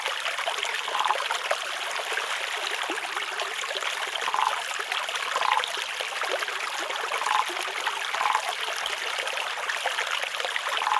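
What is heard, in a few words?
Ambience Dojo Pond Loop; Fruit Ninja; Android iOS mobile gamerip 2010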